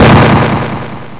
hit enemy

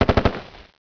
Light Bullets; The War Engine

